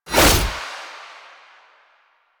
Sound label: Naginata Whoosh; Warframe; Weapons; Melee; Koumei Naginata